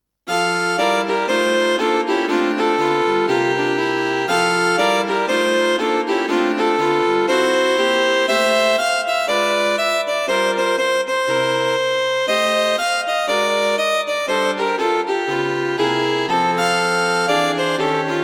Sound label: String Quartet; String Quartet for Concert performance; A suite of five, short modern pieces.